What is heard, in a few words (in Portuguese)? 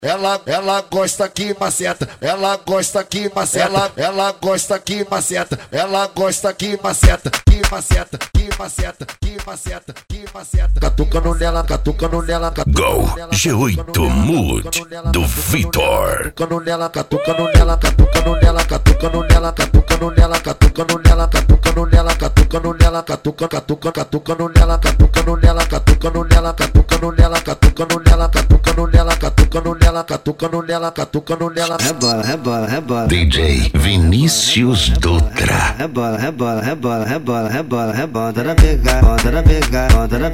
gol g8 mud especial funk ritmado